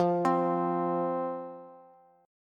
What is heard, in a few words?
F 5th F, down, Bass; F5 arpeggiated